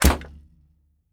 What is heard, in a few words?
Arrow Release; SteamVR; InteractionSystem; Longbow